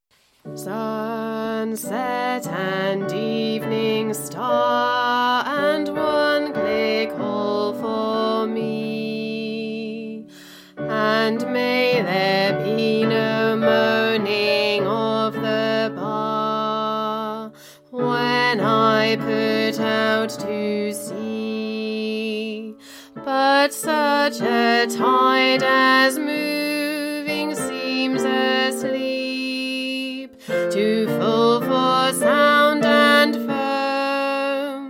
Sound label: Crossing the Bar Tenor, Choir